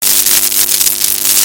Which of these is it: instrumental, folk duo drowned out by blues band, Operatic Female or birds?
birds